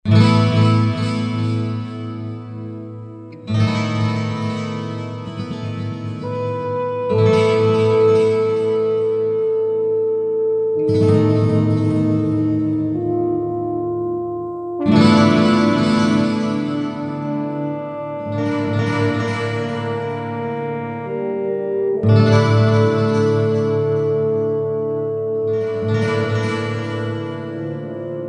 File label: Tristram Guitar (Orchestra); Diablo Anniversary